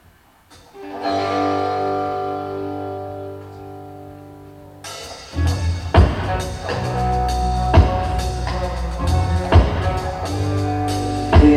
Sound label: Soundcheck, levels, intro with vocals; Broadway; Veterans Memorial Auditorium, Columbus